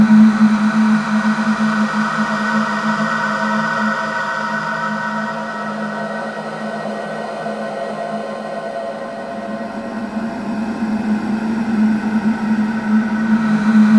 Atmos, Noises; Xfer Records Serum; Serum Presets

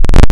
Basic Shapes, Tables Analog; Xfer Records Serum; Serum Presets

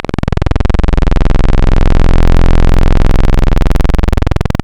PWM, Analog; Xfer Records Serum; Serum Presets

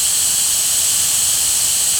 Air Can, Noises Organics; Xfer Records Serum; Serum Presets

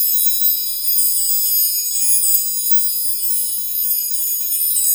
Wind Chimes, Noises, Organics; Xfer Records Serum; Serum Presets

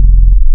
Sub Bass, Digital; Xfer Records Serum; Serum Presets

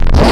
Sweep; Xfer Records Serum; Serum Presets; Digital; Evol Sweep

